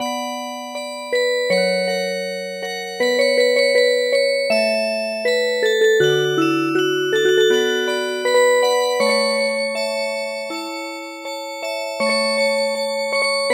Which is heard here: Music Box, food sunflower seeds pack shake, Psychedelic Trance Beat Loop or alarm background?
Music Box